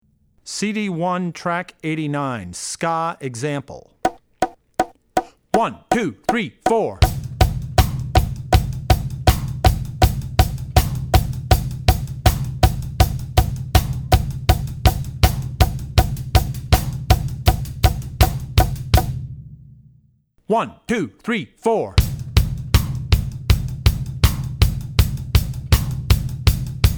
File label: Ska; Drummer's Bible Tracks